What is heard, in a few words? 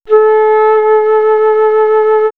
Flute; X-Static Goldmine; backtothe70's